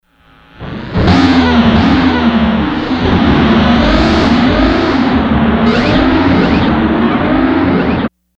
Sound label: Whole Tone; Sony HR-GP5